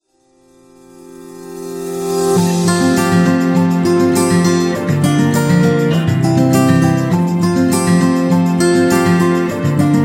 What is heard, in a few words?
Inspirational Guitar Folk